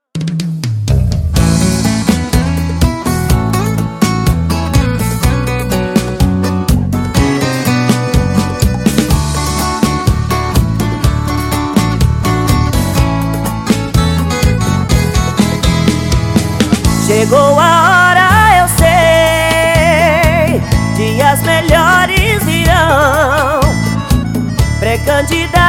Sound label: Jingle